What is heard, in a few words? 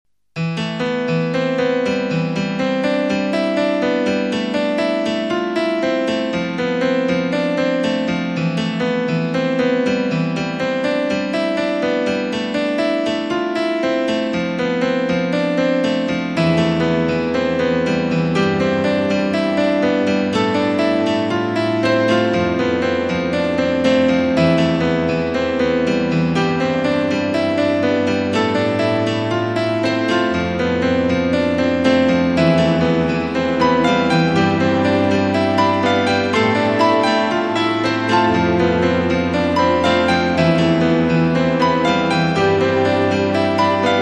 Classical Fear